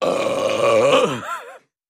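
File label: Large Belch Laugh At E, belching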